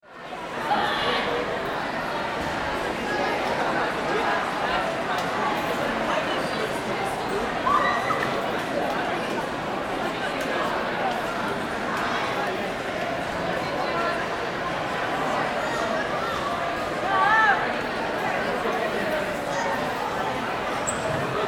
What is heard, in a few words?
School Cafeteria